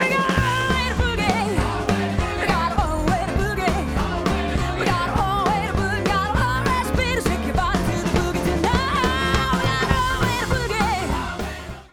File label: Automation Energy Urgency Static, Mixing Lead Vocals